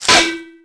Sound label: hydrant pop; natas; Tony Hawk's Project 8; XBOX